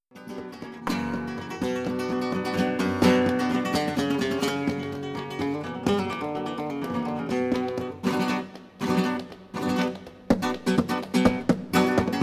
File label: Falseta